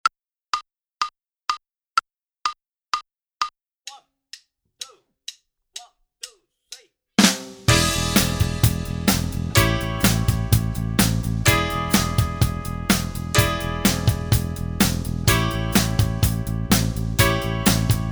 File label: Minus Guitar; Inst Ensemble